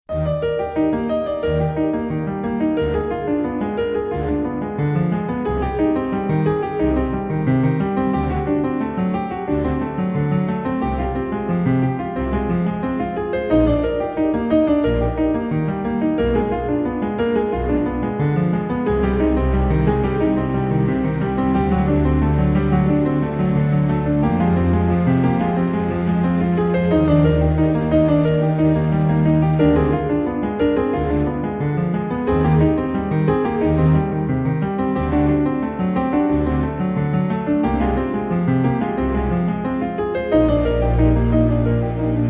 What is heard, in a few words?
5 chords arp; D#maj7 Ddim Cm D7sus4 Fm2